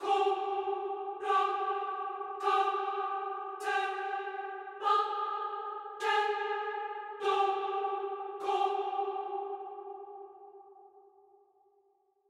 Horror Choir Syllables

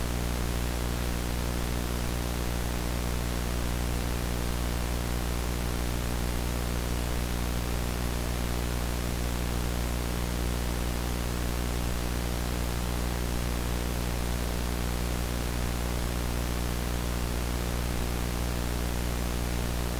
Vhs Hum Sound

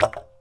Res Click

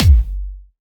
Layered Clubby Kicks

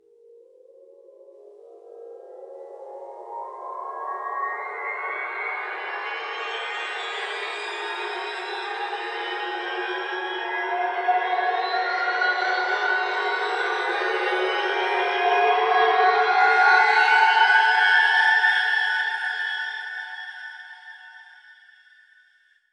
Harp Crescendo

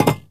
Metal Clank

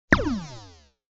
Laser Shoot

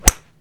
Golf

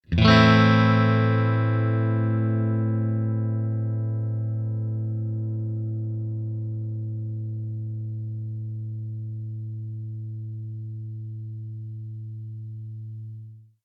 A Minor Chord Ringout

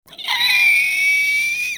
Screech

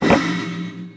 Pickups Shield Belt

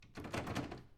Doorknob Rattle

